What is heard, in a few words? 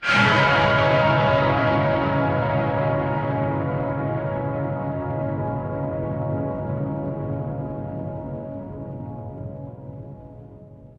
horror stinger